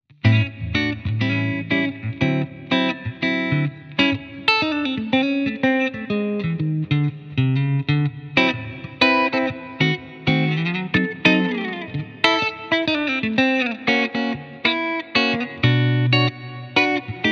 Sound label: Clean NECK; Danelectro